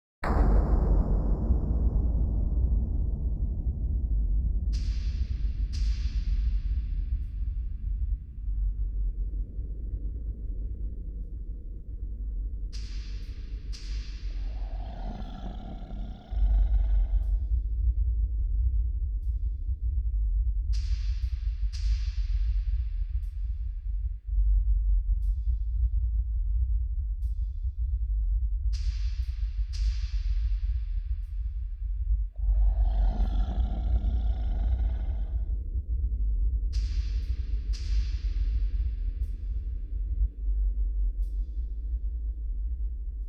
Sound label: Underground Ambience; Dark Sun Wake of the Ravager; ms dos